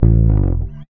REAL BASS, BASSLICKS; Ueberschall; Jam Box

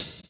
Hhat, HiHat; Equipped Music; Loungin House

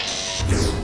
BUTTON; Best Service; Advanced Mega Effects

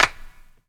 CLAPS HIP, CLP; Best Service Dance Mega Drums

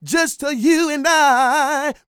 SING, Vocal Planet R&B & Dance; ILIO

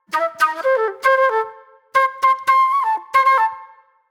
Flute, Disco; Sonic Foundry (Sony Creative Software); Manhattan Flutes; Session 04 - Cruisin' Disco

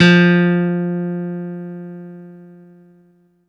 guitar F, GUITAR STEEL; ProSamples vol.55 - Retro Sampler; MIRAGE

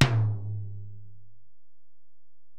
Tom, Alesis DM-PRO

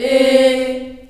C3 CHS EH, CHILD EHS, Choir; USB Soundscan vol.28; Choir Acoustic & Synth; AKAI

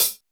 hat close; Greytsounds; Scott Peers Sound Engineering

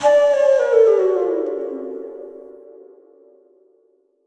Flute Effect, effected flute; Sonic Foundry (Sony Creative Software); Jazz Solos and Sections

